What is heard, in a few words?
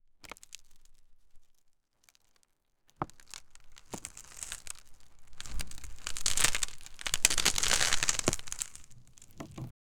bone cape rattle dry rattle